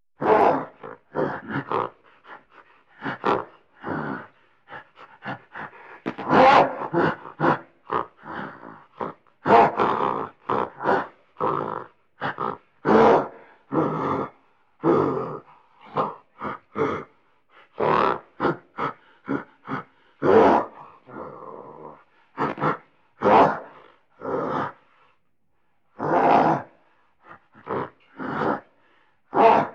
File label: Miscellaneous grunting sounds